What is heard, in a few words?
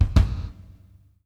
DBL GATE, KICK DRUM; Sampleheads; New York City Drumworks VOL-1